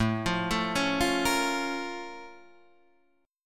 Bb Minor Major 7th

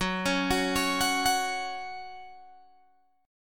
Gb 5th Gb down Guitar Open G

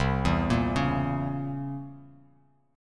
C 7th Flat 9th C; C7b9 arpeggiated; JGuitar

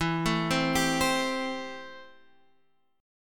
A-Minor 7th E

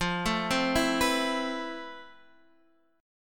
F Major Sharp 11th; FM#11; x,8,7,5,5,7; arpeggiated

